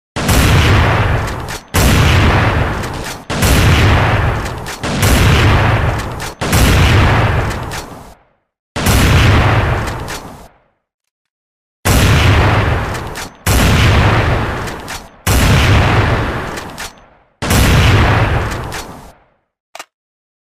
tiếng súng awm; anh nhau vu khi

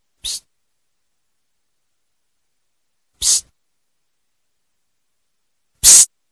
nhac chuong tieng suyt; Ám hiệu là hãy im lặng, nói chuyện khẽ thôi, nhỏ thôi; hiệu ứng âm thanh

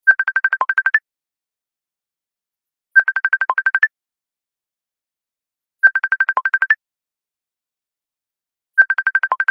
nhạc chuông cuộc gọi messenger

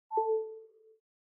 âm thanh pin yếu iphone; nhac chuong

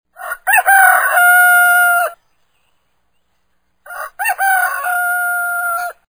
nhạc chuông gà gáy

